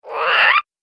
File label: Nasty Little Monster; horror; Monster Sound Effects